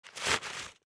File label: Hand crushing a paper bag